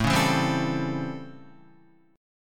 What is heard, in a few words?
A 7th Sharp 9th A, Guitar; A7#9; strummed; JGuitar